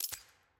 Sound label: ptt release; premade voice; vc party